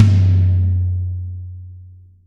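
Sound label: 14 tom; Best Service; Real Mega Drums VOL-1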